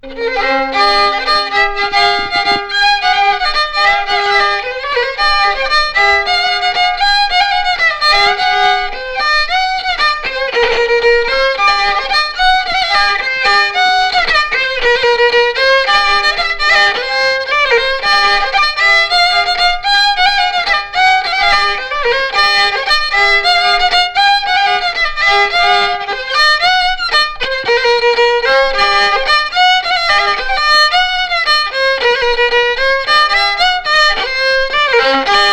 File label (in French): Polka